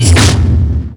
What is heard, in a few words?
Impacts